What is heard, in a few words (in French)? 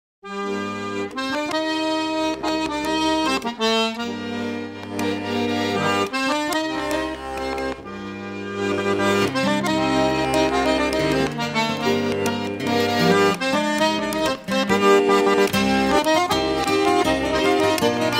Fisel Bal, accordéon diatonique en Trégor; Suite Fisel; Bro Dreger